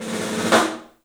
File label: STEEL ROLLS; 3D Drums